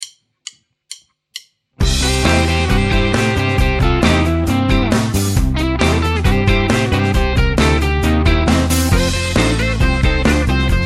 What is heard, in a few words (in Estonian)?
Rocknroll soolo